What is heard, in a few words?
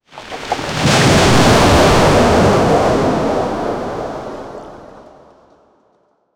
exaggerated comical sound, cartoon